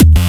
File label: free kickbass, dockick; Looneytunes; lolicore